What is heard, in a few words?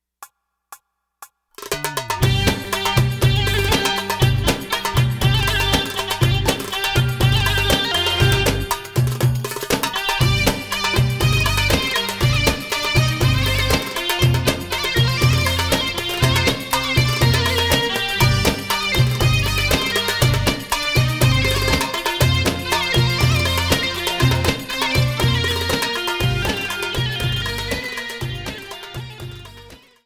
Saidi Music, bellydance music